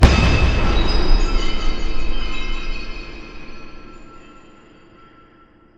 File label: Scan; sixtyfour